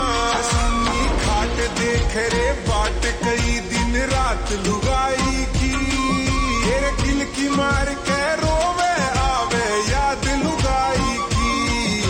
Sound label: Slow Reverb Version Ringtone